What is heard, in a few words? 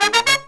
Pop & Funk Brass